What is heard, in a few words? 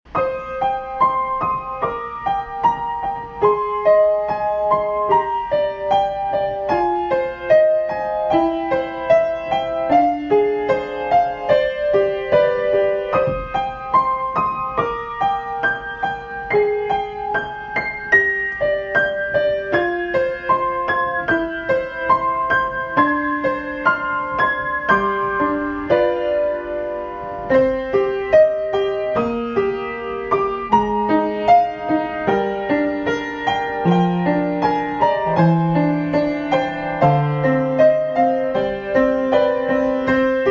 piano; last prophecy; DELTARUNE